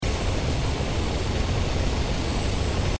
jet pack loop; Danger Mouse - Ultimate

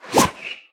uppercut; Power Rangers vs Teenage Mutant Ninja Turtles - Ultimate Hero Clash 2

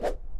swoosh; Ben 10 - Escape Route; 4arms

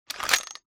stilt ground; Nick Summer Sports Stars

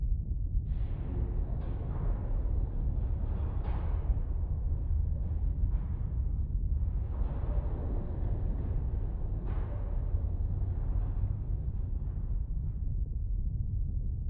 ambience space sewer; Ben 10 - Omnicode

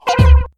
block; Battle Day Brawl; ThrillGames

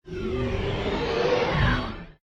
scenario portal in; Adventure Time - Break The Worm; ThrillGames

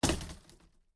impact; The Loud House - Extreme Cardboard Racing; NextlevelGames

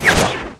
hat; Gotham City Speed; riddler

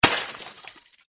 ice smash; Danger Mouse - Ultimate

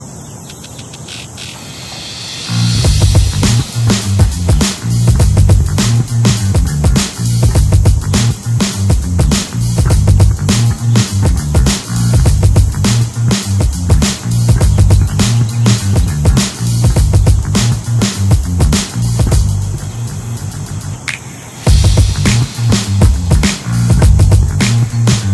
park sound; Beano - JJ's Wheelie Big Challenge

